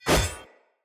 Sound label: Short Sword Whoosh; Weapons; Swords; Dendrit Short Sword